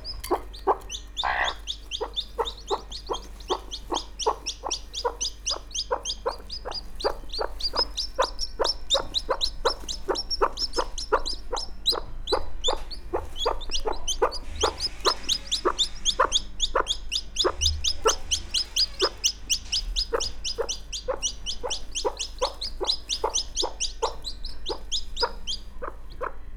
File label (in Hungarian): kotlós naposcsibékkel hűtőszekrény; parasztudvar